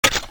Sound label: laser minigun clip out